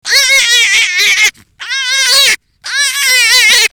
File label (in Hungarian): nyul